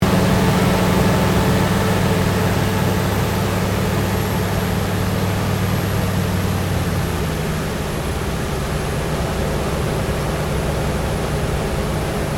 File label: AIRCRAFT CA-13 BOOMERANG APPROACH INTERIOR STEREO; Airplanes; Vehicles